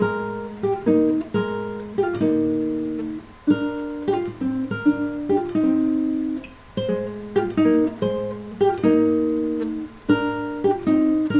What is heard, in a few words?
Guitar; Ico